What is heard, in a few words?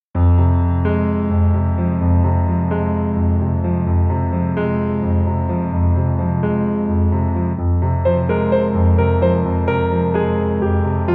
Instrumental